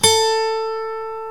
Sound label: GUITAR FLUTE